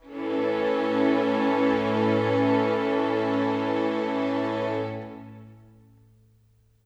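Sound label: Pad A major, Orchestral; Sonic Foundry (Sony Creative Software); Orchestral VOL-2; Pads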